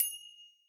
coin; Risk of Rain

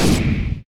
Boss Shoot; Risk of Rain

